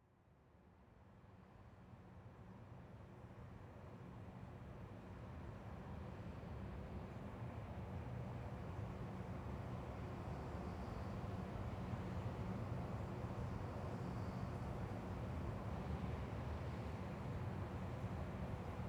關門海峽人道環境聲; 收集聲音之旅的開始。關門海峽人道; 蓄音レヱル しろがね; 海峡トンネル

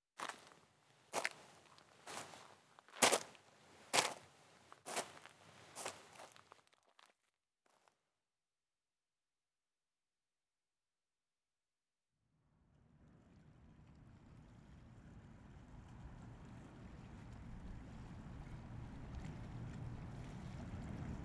「關門海峽的船隻們」（海浪聲+來往船隻們的聲音ASMR）; 蓄音レヱル しろがね; 海峡トンネル

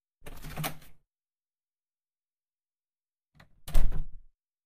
口交（仅SE）; X年级3班小穴值日生

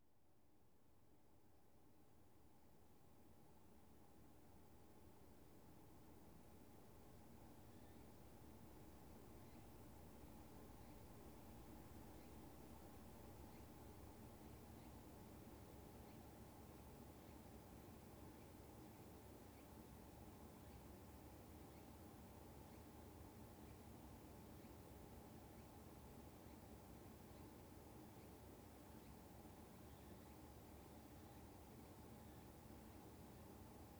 挖左耳, ASMR; 在赤井阿蘇神社挖左耳; 留音旅; 神社耳かき; 蓄音レヱル

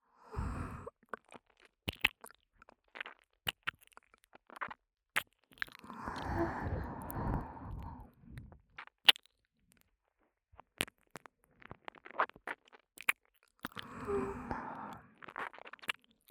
(左)舔耳_像亲吻一样温柔

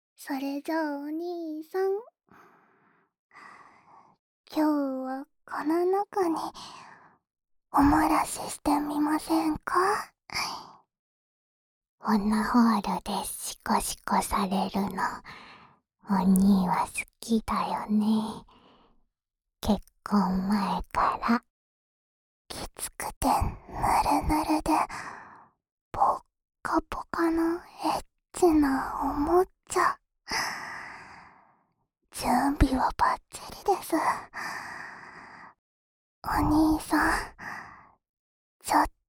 深处舔耳（右耳）, 飞机杯; 琴乃进行深处舔耳（右耳）的同时，五月用飞机杯手交; 究極耳舐め; 幼妻姉妹と送るいちゃらぶ新婚耳舐めASMR

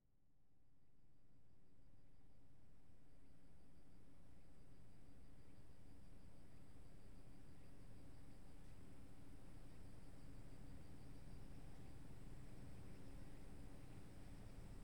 塗抹乳液、掏左耳(塗抹止癢藥膏。竹製掏耳棒); 蓄音レヱル; キャンプ

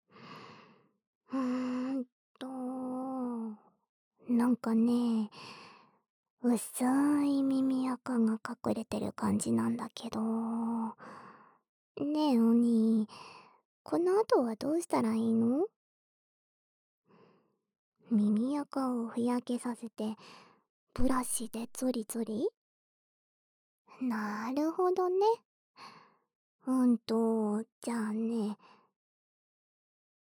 【左】手指掏耳; 掏耳分段音軌

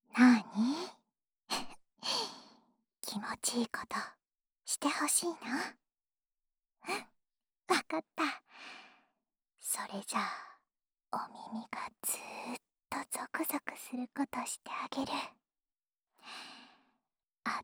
安眠用耳边呼气循环（右耳）, ASMR; X年级3班小穴值日生

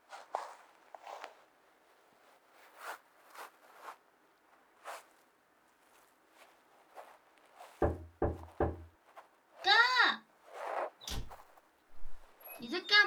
掏耳; 討論企畫的時候耳朵拉長點！

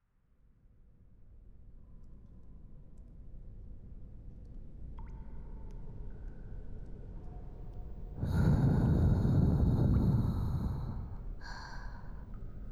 耳朵清扫（右耳）; 雪女; 雪儿; 妖怪乡愁谭 ～雪女 小雪～

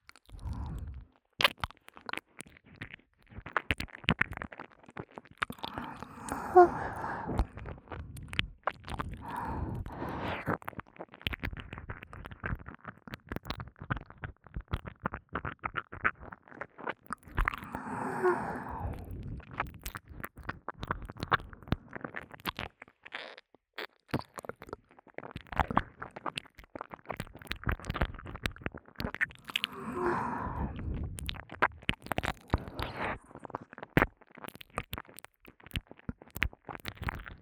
(左)舔耳_咕啾咕啾; 仅仅是沉溺于舔舐声中; 舔舐声特化

